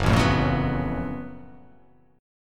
F sharp Minor Major 11th

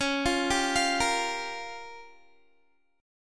Db 6th Flat 5th Db down Guitar; Db6b5; arpeggiated

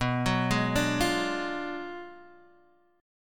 B Minor 6th Sharp 11th B; Bm6+11 Chord {7 9 6 7 6 X} arpeggiated

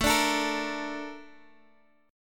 A sharp Major 7th

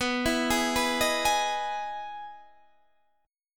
E Major 7th B, chord

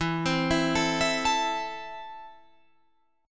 E Suspended 4th Sharp 5th, Guitar Drop D

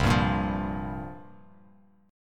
C 7th Suspended 4th, Bass Standard

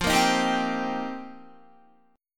F 7th Suspended 2nd Suspended 4th, Guitar; F7sus2sus4; strummed

